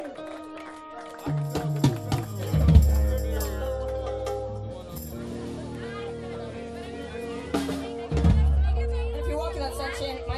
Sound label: drum iso